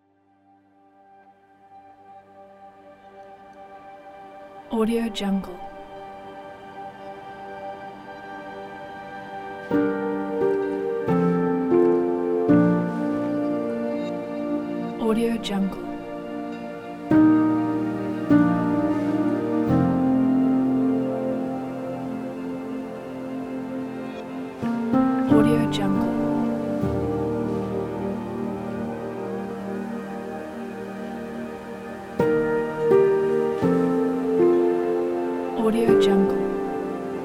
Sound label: cinematic soundscape emotional drama